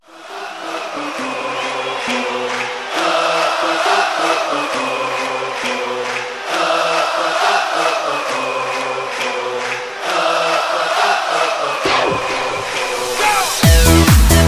klingelton torhymne; benfica lissabon torhymne; slb

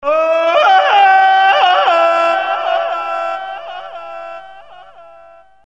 Tarzan Schrei

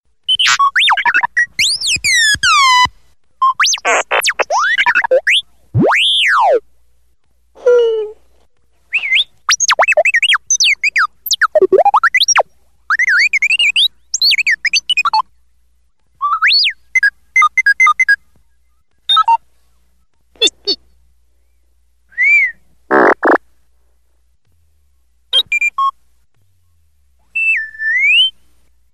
r2d2 sms ton

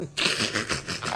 ernie lacht; sesamstrasse; ernie's lachen

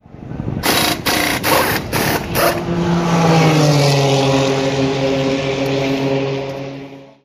Boxengasse sound pithorn Boxenhupe

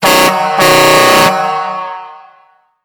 lkw truck horn; hupen klingeltoene; lkw auto oldtimer

